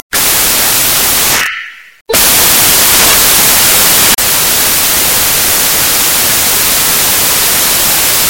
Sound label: Whistling